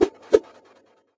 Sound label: kung fu staff, swings; UNUSUAL KITS and FX